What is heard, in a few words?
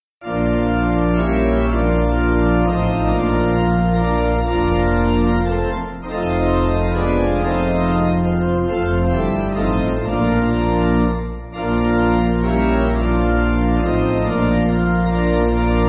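Pipe Organ, church backing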